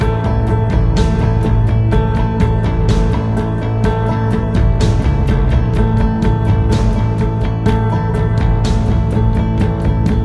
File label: pray for death; left4dead; Source engine